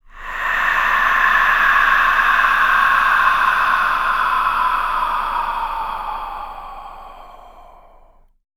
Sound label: A O SWEEP, VOCAL WIND; ILIO; Vocal Planet; Jazz & FX